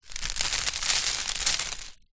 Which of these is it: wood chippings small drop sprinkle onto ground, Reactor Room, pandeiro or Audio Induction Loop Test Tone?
wood chippings small drop sprinkle onto ground